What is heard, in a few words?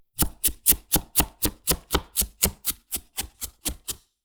Mid Chopping; soundscrate